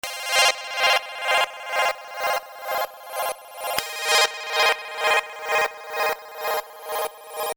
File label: Bpm128 F Crystal